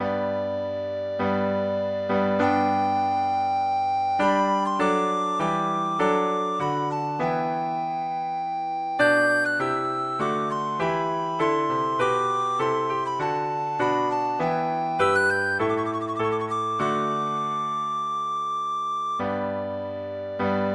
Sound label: recorder